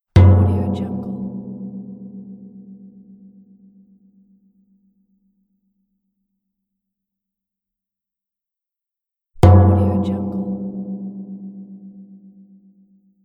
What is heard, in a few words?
metal gong